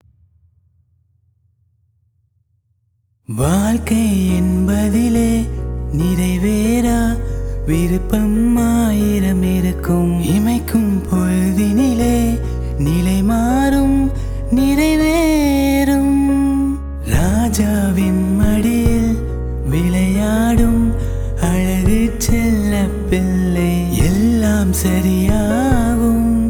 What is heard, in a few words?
Unplugged Cover